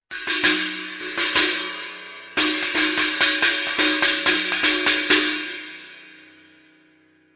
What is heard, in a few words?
mini china, cymbal; sabian